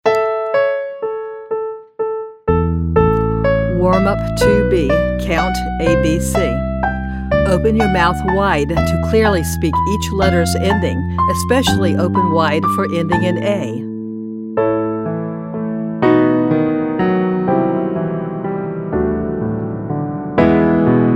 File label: Vocal Warm Up Count ABC